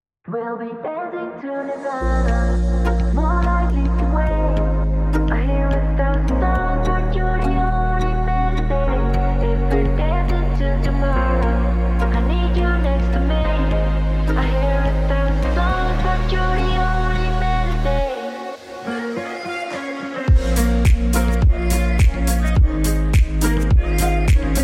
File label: chill house lounge; Barcelo